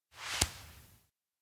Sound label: hold hand link; awayuki